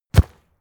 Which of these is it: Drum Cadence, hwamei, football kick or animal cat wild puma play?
football kick